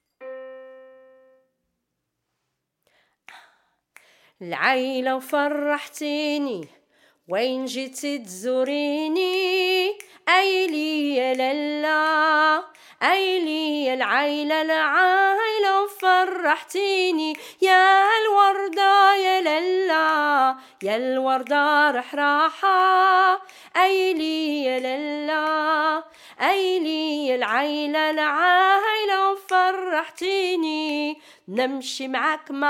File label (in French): COUPLET APPEL REPONSES VOIX